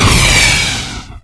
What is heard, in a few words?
mortar; hl2